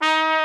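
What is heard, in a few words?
brass bright trumpet; Roland L-CDX-03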